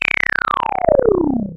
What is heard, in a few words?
Classic FX; radiosonics; Classic Simple OSC FX